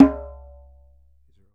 DJEMBE, African Perc

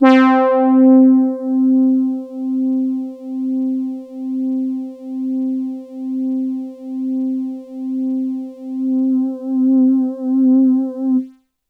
SOLO BRASS, Synths

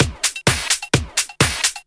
Clubhouse Loop 128 BPM

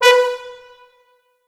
B3 R, Orchestral Brass

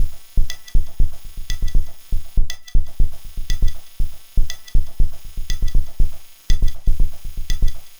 Minimalism, Processed Percussion

